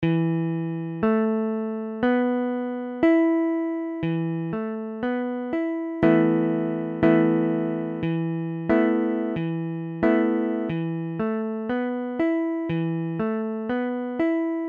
Esus4, guitare

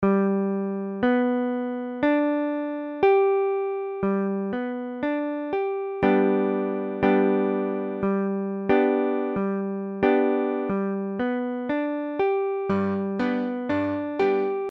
III G, guitare

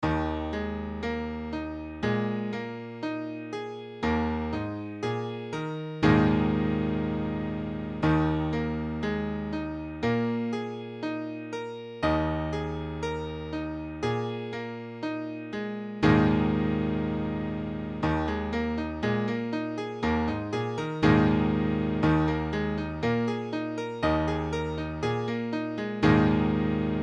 Ebsus4, piano

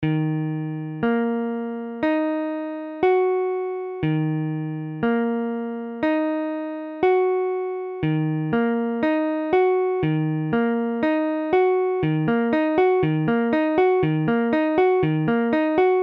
VI D dièse, guitare

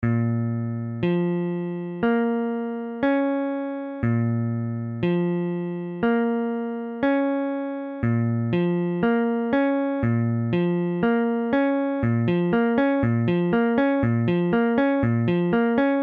guitare, Bbm

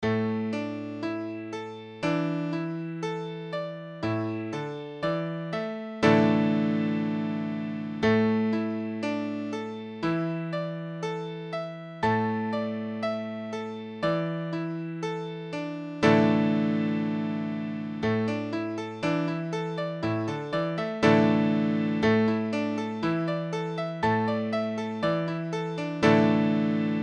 Asus4, piano

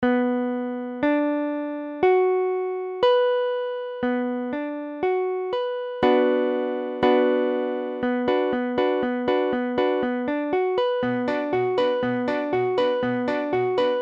VII Bm, guitare

